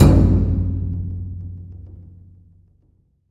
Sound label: KODO, JAPANESE